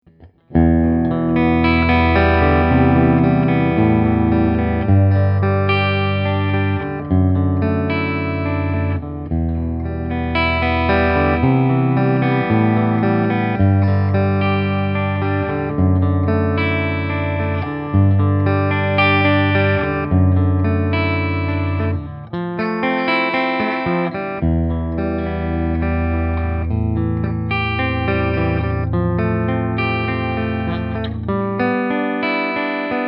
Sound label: Boss Katana Artist MKII Acoustic